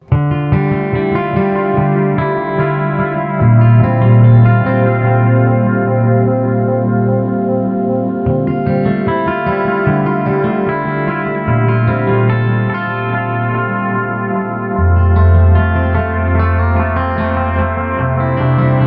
Ambient Delay

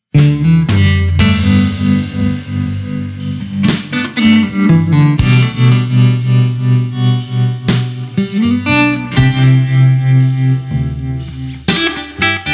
Classic Triangle Wave Tremolo

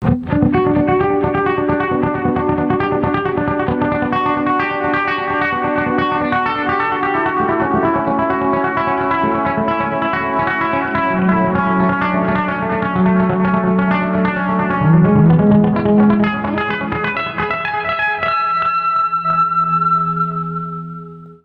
Dotted Eighth Note Delay

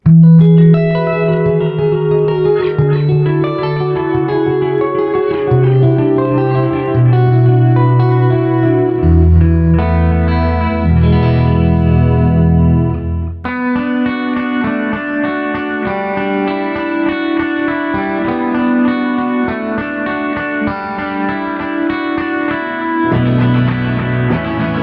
Long Delay with Chorus Modulation

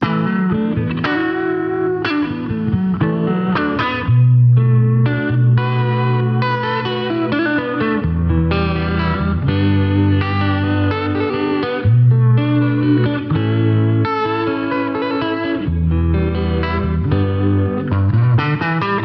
Uni-Vibrato; Eddy

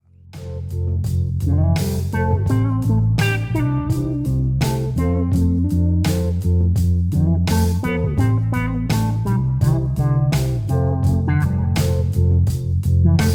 Mellow Low Pass Filter setting with OD

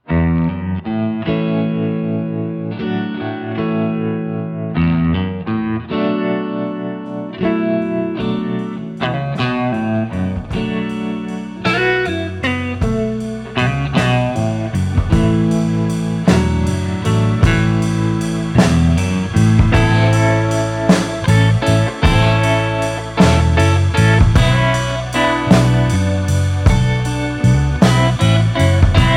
Stereo Tremolo

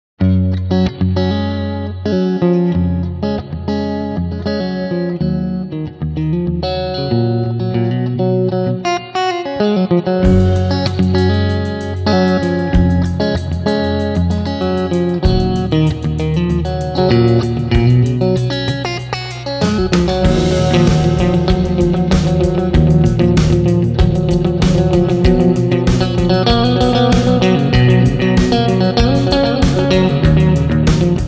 Reverb Delay in FX loop Dirt Pedal in Front

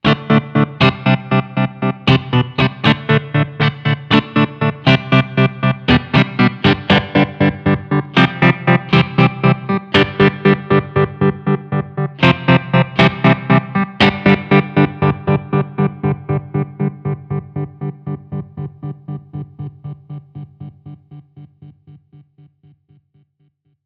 Narrow Pulse Tremolo

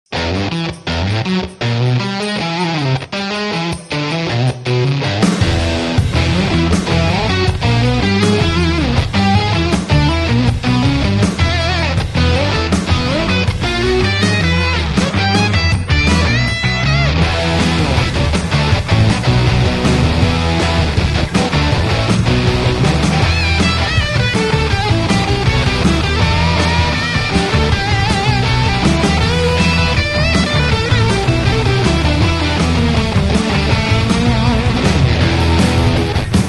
Ripped Speaker Fuzz Maxed

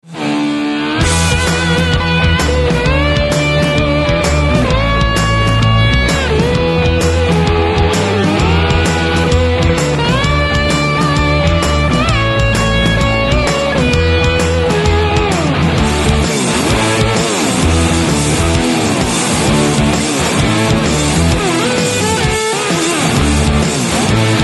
Ripped Speaker Blues rock fuzz

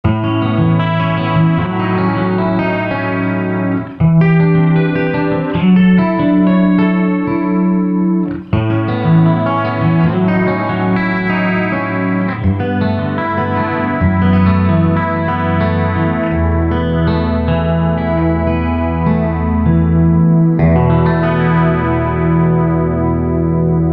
Chorus Effect

